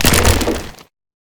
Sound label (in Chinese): 嘎枝攻击声